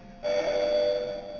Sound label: low alarm